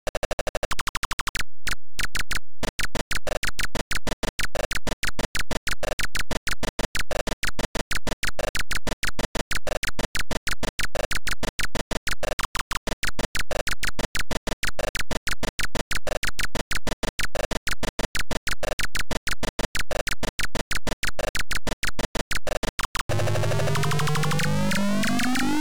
Title Beeper; Cobra

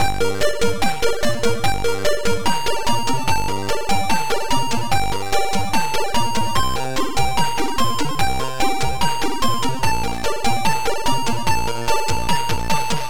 Guitar

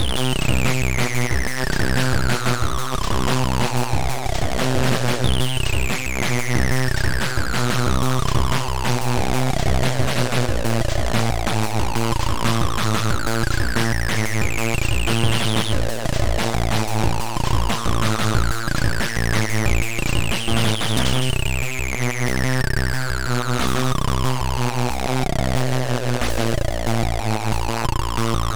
Crash

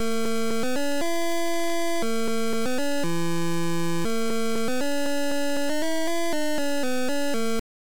Title Beeper; Classic Muncher

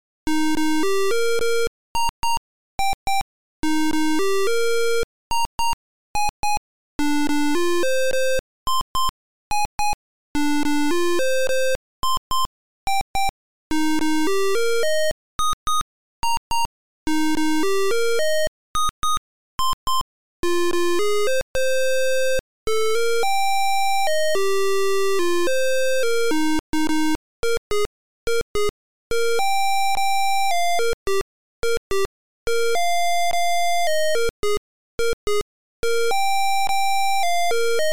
MELODY

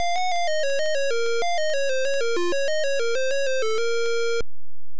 Title Beeper; Gunfright

